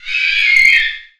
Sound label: jumpscare, cursed owl; quitoypongo